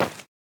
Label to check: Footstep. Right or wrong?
Right